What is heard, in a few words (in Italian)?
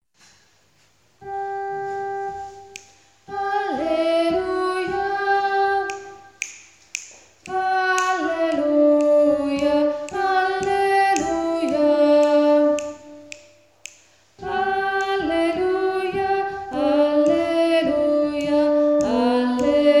alleluia contralti; hassler